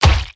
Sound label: Warrior Damage; we are the dwarves gamerip 2016